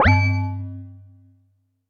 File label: U220 FX Set, Drum Machines; Roland U220